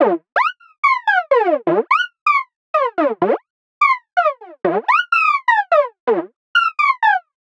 126 F# MUSIC; funky; Loopmasters; Funky Underground Vol 2

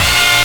Brass Blaster; Fx; Loopmasters; Jon Carter & Alex Blanco; Block Party Essentials

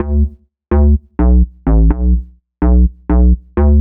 126 D B BASS, Funky; Loopmasters; Funky Underground Vol 2; BASS LOOPS

